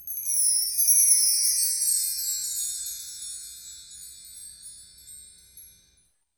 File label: PRC MARKT, Marktree; Roland LCDP03 Orchestral Perc; Wind Chimes2